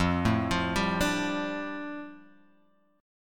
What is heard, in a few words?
F 6th Add 9th F Guitar Half Step Down